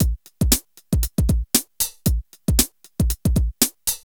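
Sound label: drum loops, right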